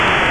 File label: air attack; Empire Master 2.6 Demo